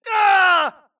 grunt death crush; Titanfall 2

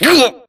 grunt hits; Titanfall 2

